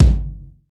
prominent tr 606 synthetic kick drum a sharp key, Royality free tr 606 electronic k